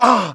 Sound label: hit, pain; mohaa